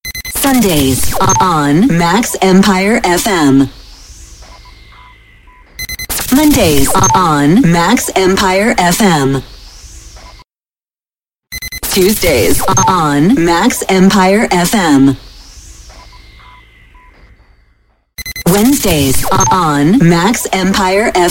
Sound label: WEEKDAYS AND TIMES JINGLE CALL OUT